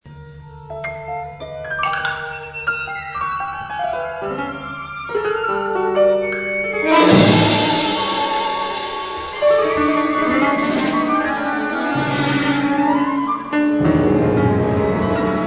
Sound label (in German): Klavierkonzert